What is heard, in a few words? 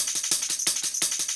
D&B Percussion Loop; neuro